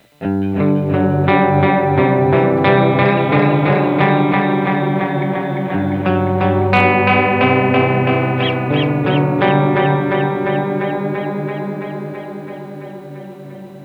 mod delay

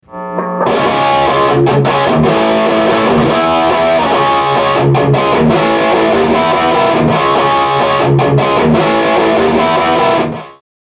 No Ducking Mix

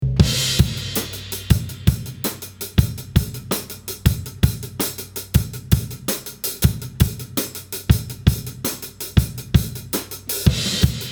Section A Room

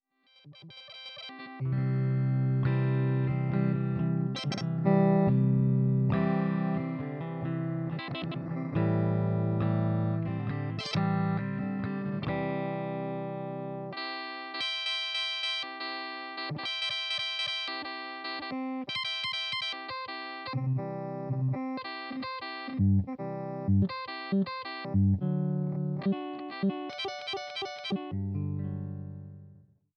GLITCH, lo-fi sounds

